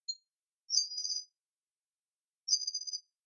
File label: Little Pied Flycatcher male at nest; Amar